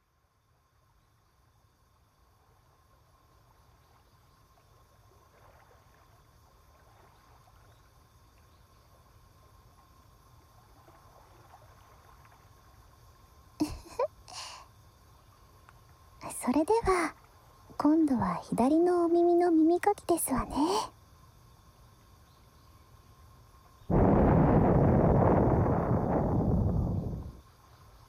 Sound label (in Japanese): 「島のテントで左耳耳かき」, ASMR